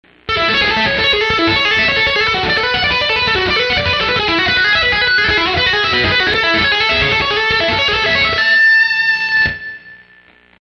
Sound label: Lick